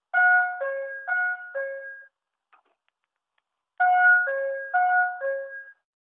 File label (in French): sonnerie lycee; Sonnerie Lycée camille see